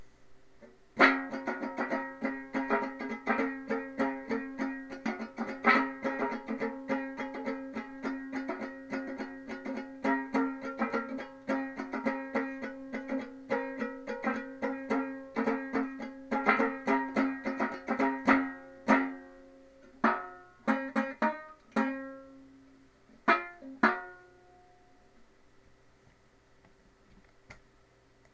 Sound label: uke test